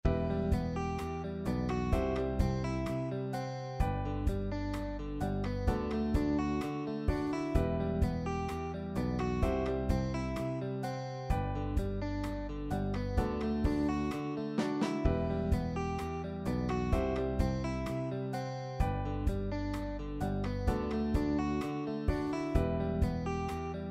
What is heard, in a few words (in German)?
Bandpattern Popballade; Popularmusik